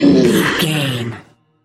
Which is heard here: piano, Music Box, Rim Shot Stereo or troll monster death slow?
troll monster death slow